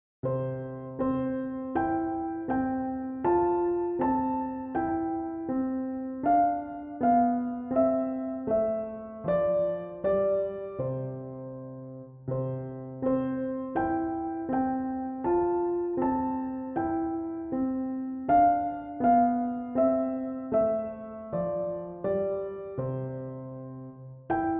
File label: piano